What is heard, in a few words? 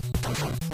punch; batman